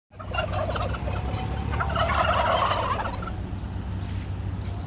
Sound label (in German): Puten